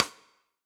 Snare Sidestick Bright Room; Drum Hits; Session Drums Studio; Standard Hits

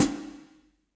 Hihat Foot Dark Plate Verb, Drum Hits; Standard Hits; Ableton; Factory Packs; Session Drums Club

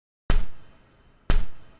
IDM Perc, IDM Percussion

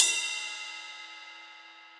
Ride Bell Big Punchy; Drum Hits; Standard Hits; Ableton; Factory Packs; Session Drums Club

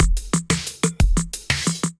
Nightly Kit, Drums; Ableton; Drive and Glow

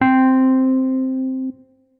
Wurly El De-Facto, Wurly Piano; Electric Keyboards; Ableton; Factory Packs

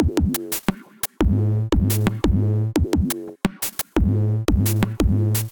87 Funk, Drum Machines; Fire Uncle Funkle; Ableton

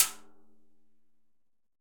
Timb L Cascara, Timbales; Latin Percussion; Drum Hits; Ableton; Factory Packs

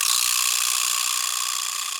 Vibraslap; Latin Percussion; Drum Hits; Wood; Ableton; Factory Packs

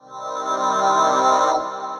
Larynx Pad, Synth Pad; Ableton; Factory Packs; Synth Essentials

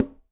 Quinto A Tip, Conga; Latin Percussion; Drums; Drum Hits; Ableton; Factory Packs

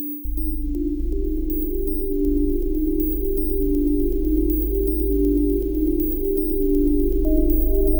Nausia Pad Split, Synth; Ableton; Factory Packs; The Forge by Hecq

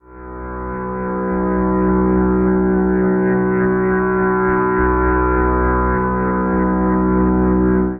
Drone E-Tanpura; Instruments; Ableton; Drone Lab; Xtonal

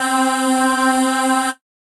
Voice Aahs A; Voices; Ableton; Factory Packs; Synth Essentials

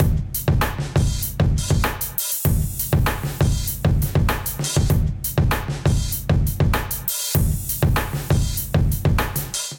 Groove 85BPM, Drums; MIDI Clips; Canvas; Ableton; Factory Packs; Chop and Swing

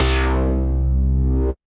Bass; Deviant; Ableton; Glitch and Wash

